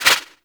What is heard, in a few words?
Turbulence Deflect; Warframe; Tengu